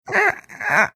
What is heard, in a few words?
sweet squeaks of an infant marsupial